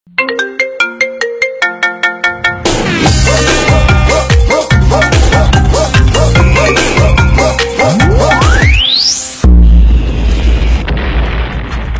Marimba Remix

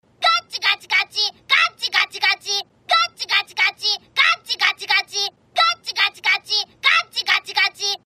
Ringtone

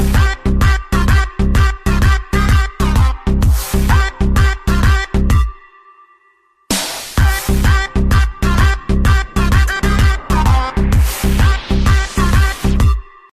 Party Ringtone

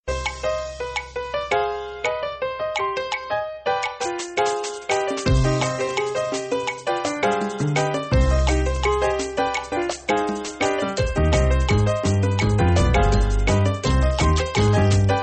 relax ring tones; sms

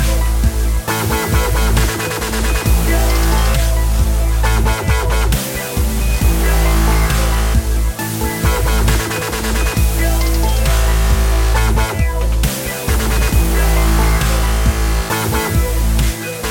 Liquid Dubstep

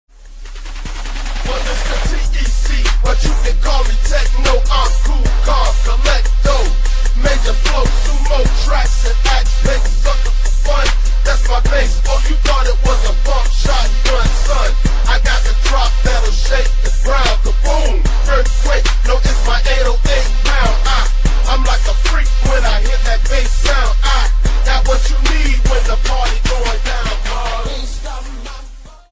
bass

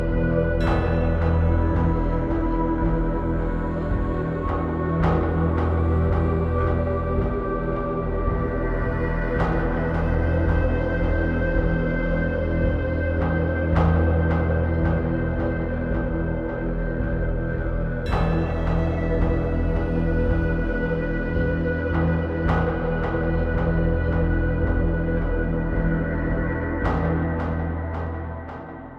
Spooky Suspense

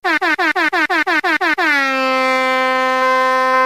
horn dj effects

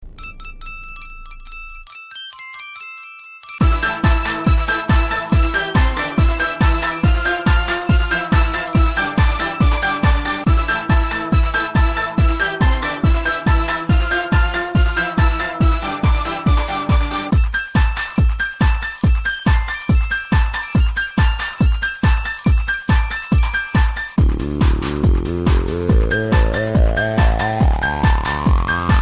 jingle bells remix; ringtones

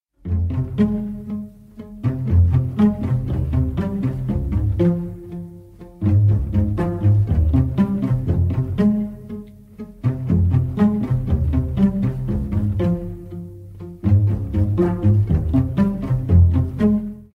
Viola

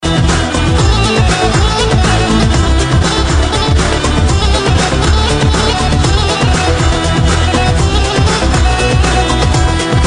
Serbian etno

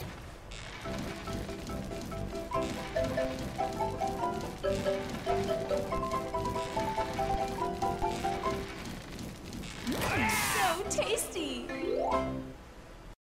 BBQ spit tone

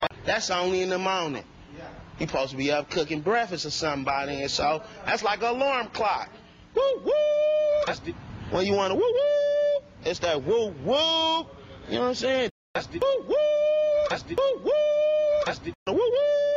woo hoo whoo hoohoo

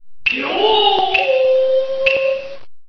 Kabuki yell

